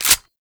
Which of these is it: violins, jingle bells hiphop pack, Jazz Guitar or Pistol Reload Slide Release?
Pistol Reload Slide Release